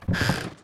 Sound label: chest open; Clicker Heroes